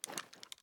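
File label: pistol reload empty extended mags raise; gmod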